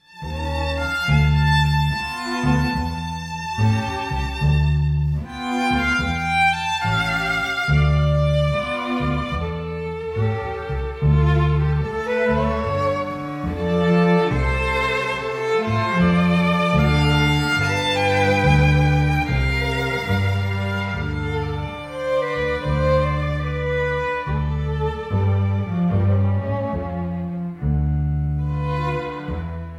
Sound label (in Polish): kwartet smyczkowy